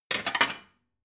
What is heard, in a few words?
plate cupboard take slide hard